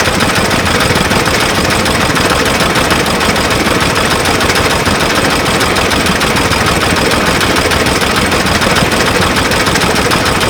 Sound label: Settlement Spinning Fan Machine Loop